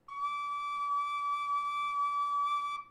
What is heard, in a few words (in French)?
Flute ouverte